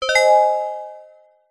multimedia alert notification basic bell synth positive; zapsplat